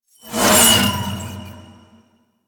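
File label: Weave Boss Projectile Whiz By; Warframe; Cephalon Weave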